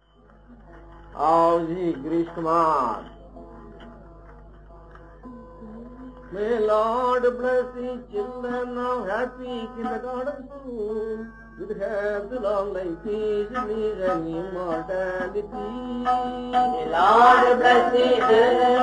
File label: CHANTS WITH KIDS; Swami Sivananda